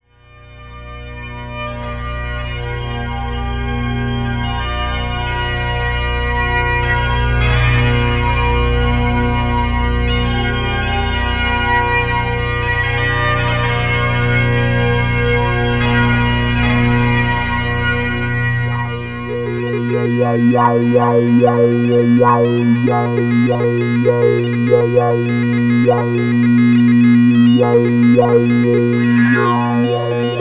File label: Digital Chanter FX pedals